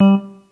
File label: Synth